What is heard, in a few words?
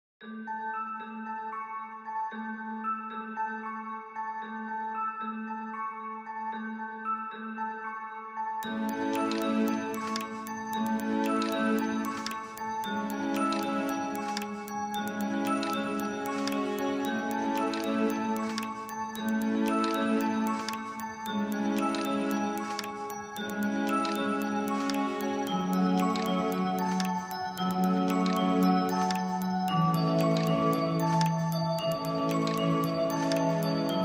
Instrumental